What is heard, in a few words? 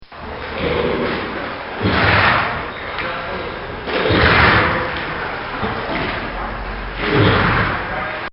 Swimming Pool Therapy For Horses; Bobby Cole